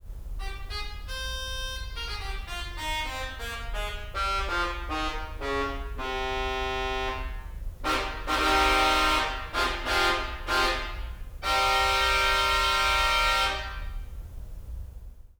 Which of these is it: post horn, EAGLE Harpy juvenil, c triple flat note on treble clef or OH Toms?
post horn